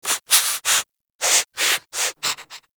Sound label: Armadillo Vocal Sniff Multiple; Warframe; Characters; Hunting Critters